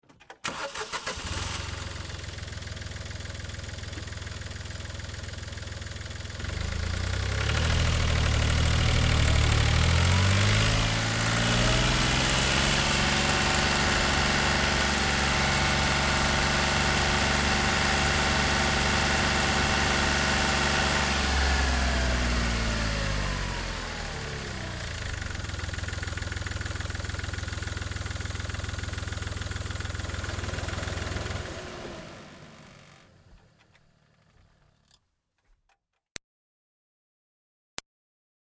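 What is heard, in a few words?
Car Smart Car Onboard Reverse; 2005 Smartcar Fortwo; Vehicles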